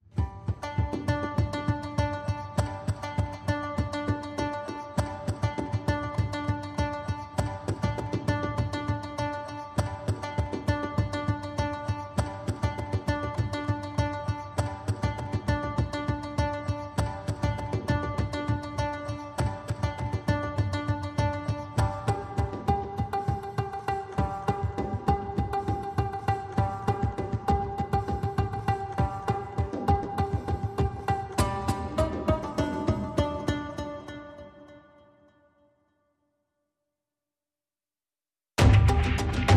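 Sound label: Percussion & Lute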